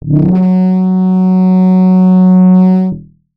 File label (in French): trombone lineaire